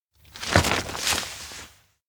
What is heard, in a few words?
umbrella fold; aino; anemoi